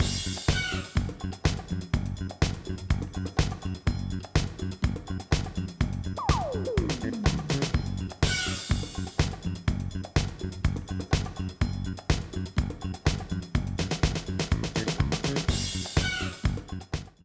Lighthouse Disco

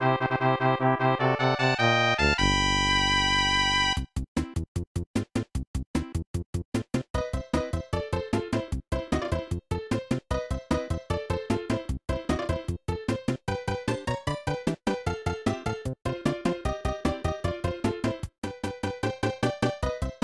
Battle Mode; SMAS; SMB3

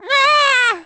Diddy Kong Panicking

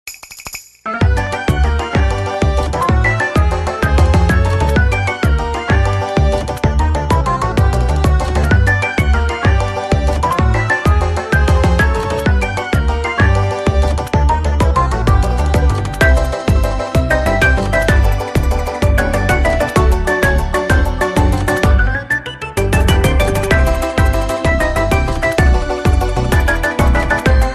Spinning Polka

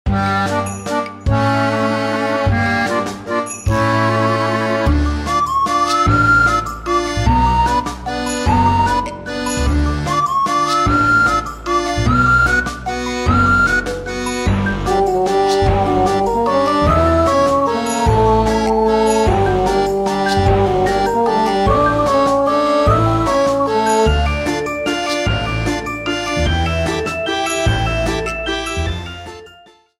Horror Land Night

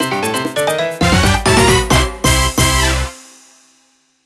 Mini-Game Win; Mario Party 9

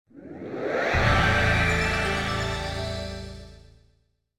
64DD start up; Nintendo

